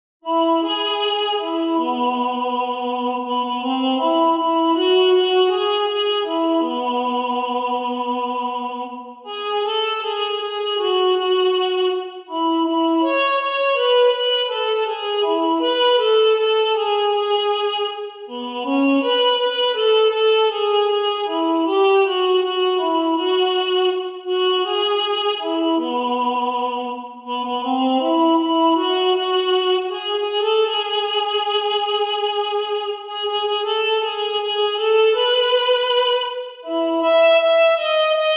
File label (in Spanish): Melodia; Coco